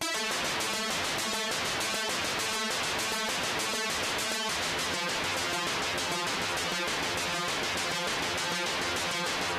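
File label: Sound Prom Arpeggiator; Remember Me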